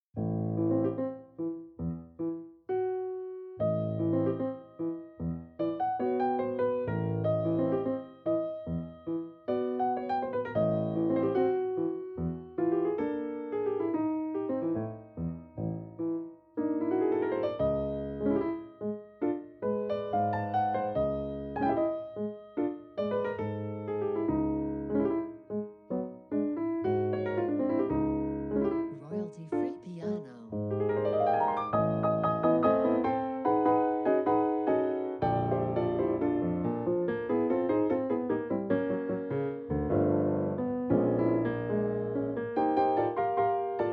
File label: jazz studies tango